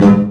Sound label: nut bounce, tree